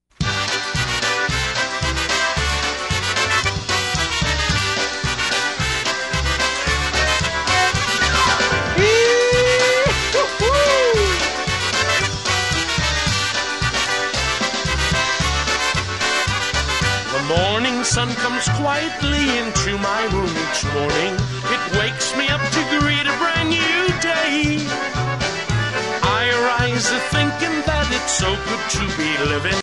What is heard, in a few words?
Polka